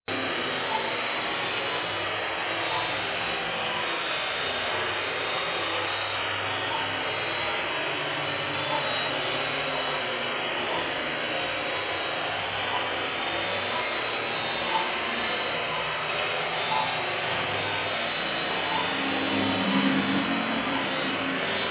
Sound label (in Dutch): fragment evp spook gelach